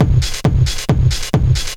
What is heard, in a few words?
Drums Techno